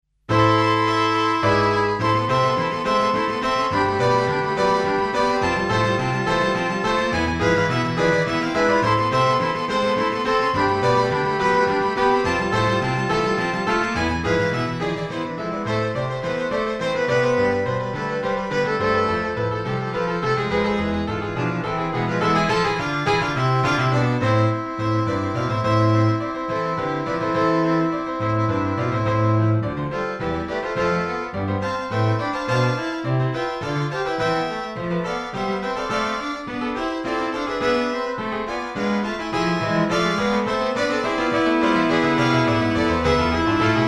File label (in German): Quintett